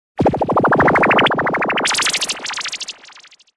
dancehall lazer

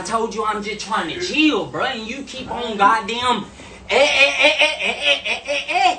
ehh ehh ehh

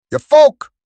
ya

